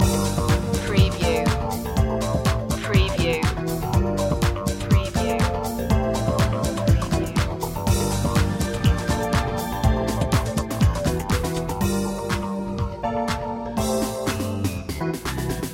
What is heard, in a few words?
snapshot style loop